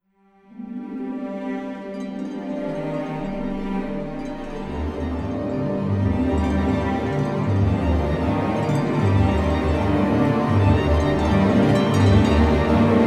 harp

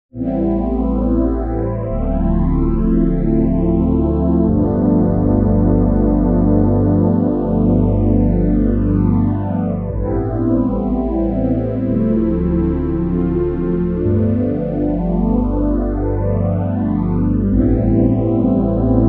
phase pad; opxpro3

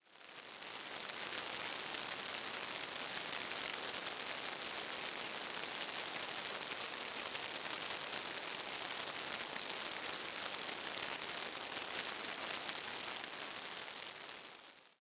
heavy shower

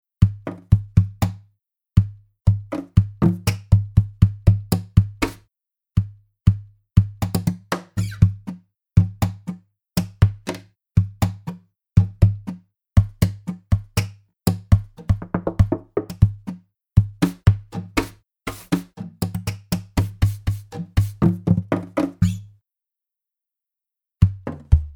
acoustic guitar; borth audio

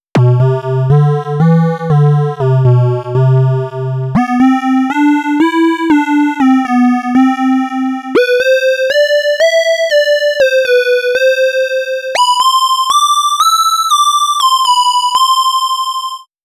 programmable wavetable

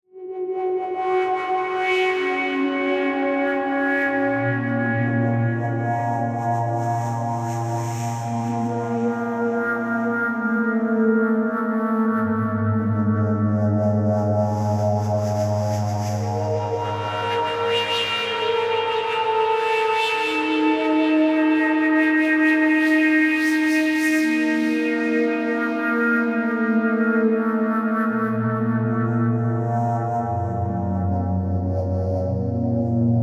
FM artifacts

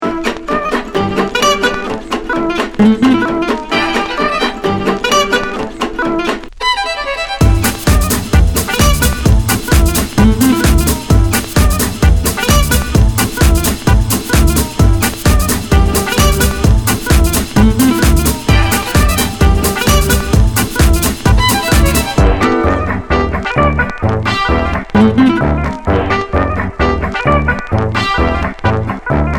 manouche

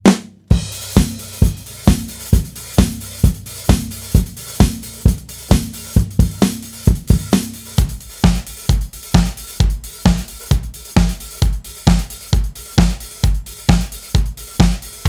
disco beat dry; drum vault

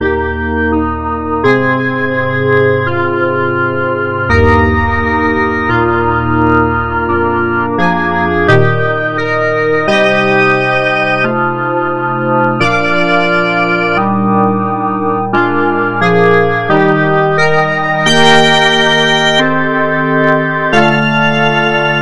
keys swirling organ

